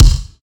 studio bass drum sound D# key, Royality free kick drum single shot